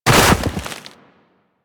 Land Soft Deep Snow; Footsteps; Warframe; Operator

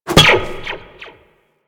Zipline Grab; Gadgets; Parkour; Warframe

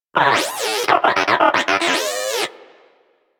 Moa Inquisitive Vocal Enemy Spotted; Warframe

